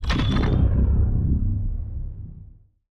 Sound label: relic pulsestone; Stellaris; grand archive; relics